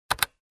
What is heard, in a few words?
Single key press on keyboard